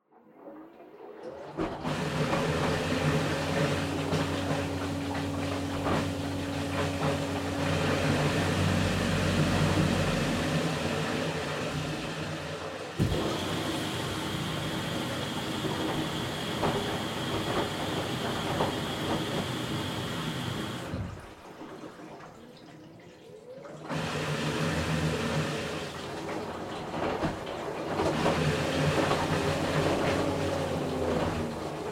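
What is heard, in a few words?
Washer Front Load Operation Noise Wash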